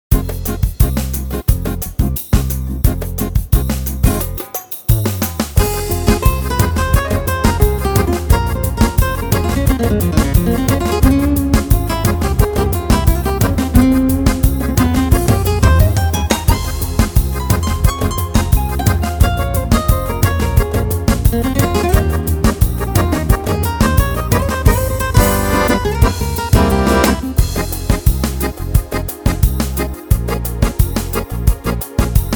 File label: Gypsy Bossa